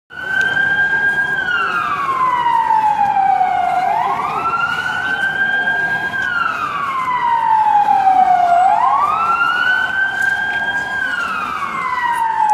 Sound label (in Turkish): Ambulans Sireni Sesi